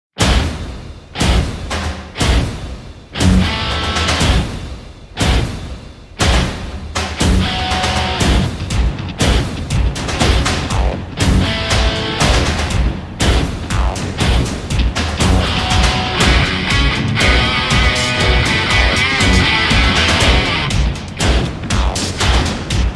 Guitar Riff; Initial d

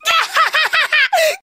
Laugh; Narancia

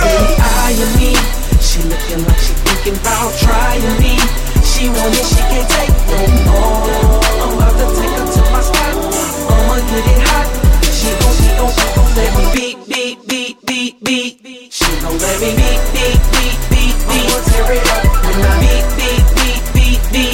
beep beep beep rmx; ringtones; hip-hop

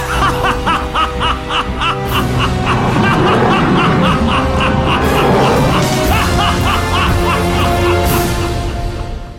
evil laugh

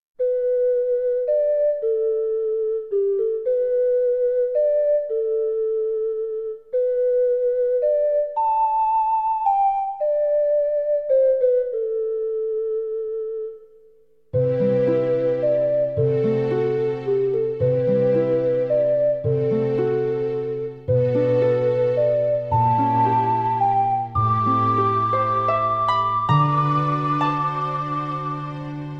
ocarina; Harlock

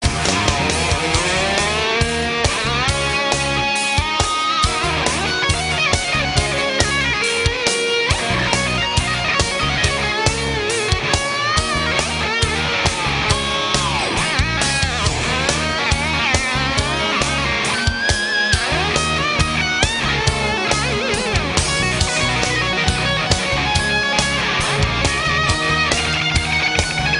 music

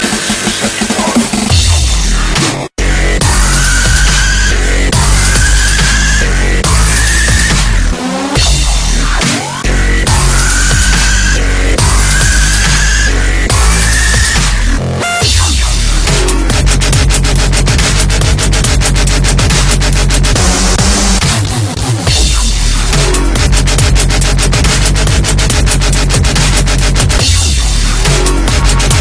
dubstep; transformers; alternative

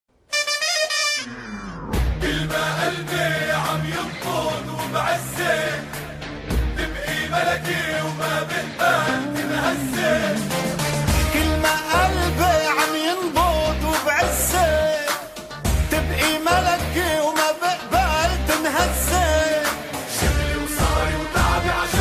arabic bast

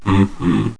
Is Calling Ska, ringtones

